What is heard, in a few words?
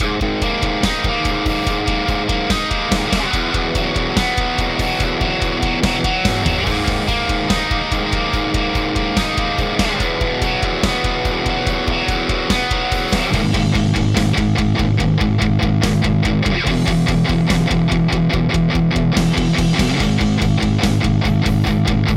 TS7 TS9 mode